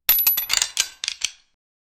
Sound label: medieval crossbow reload